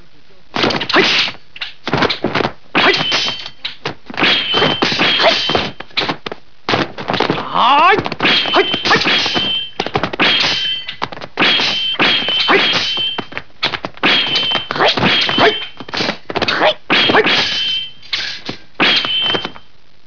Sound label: Fight; 特酷声音素材库